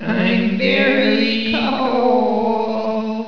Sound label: cold